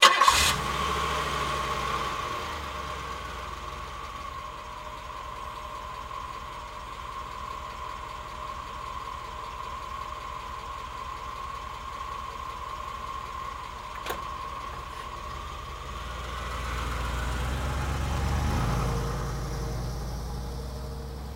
Cadillac 1990 Exterior Start, Idle, Pull Away Medium Speed